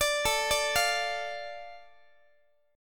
A Suspended 4th Sharp 5th down Guitar; Asus4#5; arpeggiated; x,x,17,19,18,16

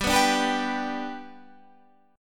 G Major, Guitar, Half Step Down

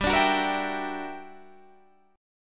G Minor Major 13th, G; GmM13 strummed; 15,17,16,15,17,15; JGuitar

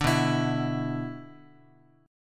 B Minor Double Flat 5th B Guitar, chord; Bmbb5 strummed; JGuitar

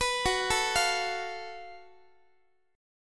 F sharp Minor Major 7th Double Flat 5th, Guitar; arpeggiated; x,x,14,16,13,16

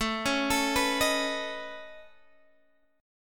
C sharp 7th Suspended 2nd Sharp 5th A

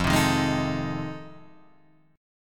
F 7th Sharp 9th

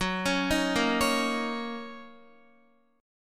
Fsharp 6th down Guitar; F#6; arpeggiated

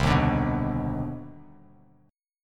C Minor Major 9th, Bass Standard, CmM9 chord; strummed; JGuitar

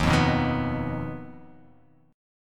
D sharp Suspended 2nd Sharp 5th D sharp

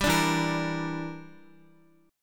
E Minor 7th Flat 5th, Guitar; strummed; 4E,4A,5D,5G,5C,6F; Em7b5; x,x,5,7,4,5

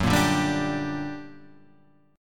G Minor Major 7th, Gb, guitar chord

